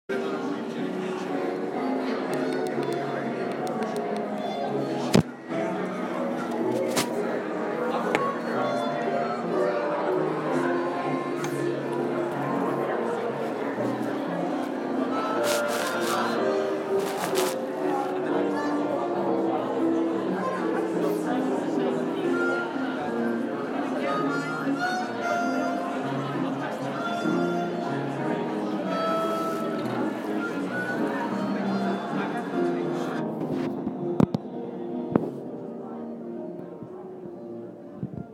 foyer music, theatre; signature theatre w42nd st nyc

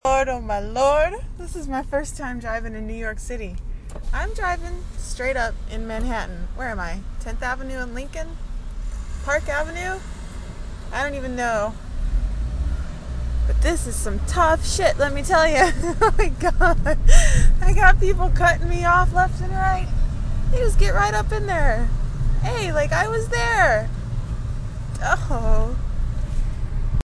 drivin in nyc

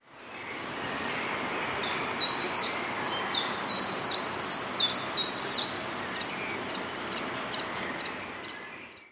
chiffchaff

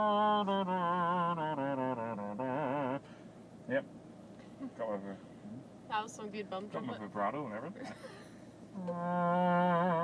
bum trumpet solo

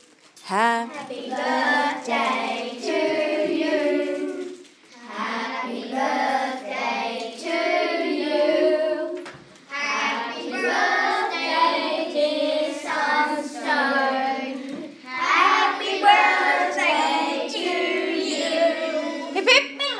sunstone song

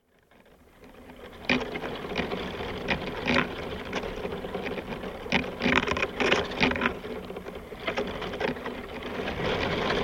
wind blowing a tree

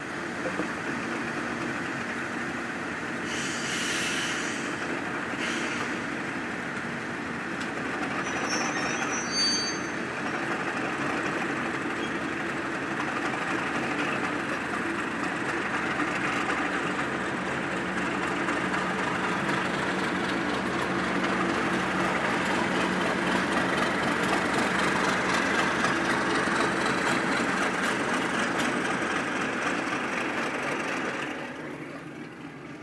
noisy train

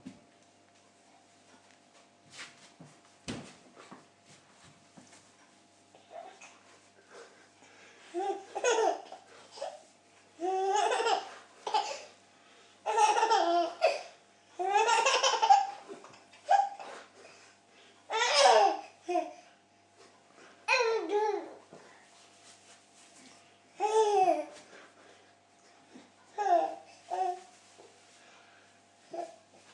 giggling

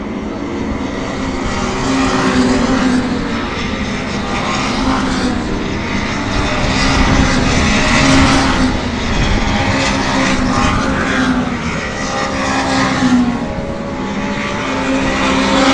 Renault World Series Silverstone

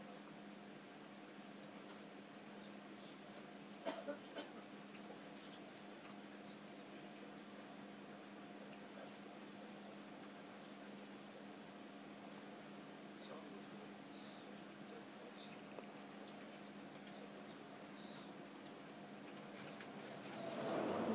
on train diverting around Bristol

